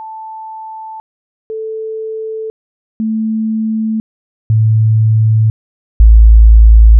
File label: toot